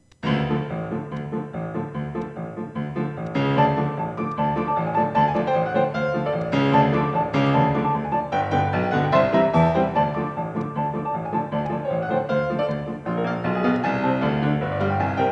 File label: rAGtIME